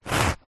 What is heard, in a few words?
paper tissue cutting with serated knife single slice; foley